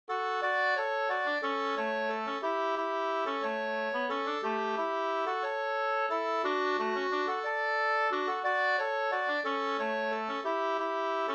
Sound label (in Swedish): folkdans Ddur; Hammerschmiedgesellen 2st; version i D-dur